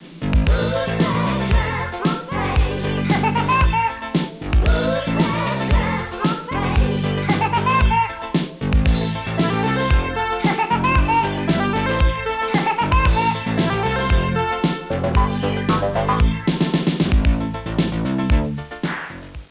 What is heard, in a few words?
wood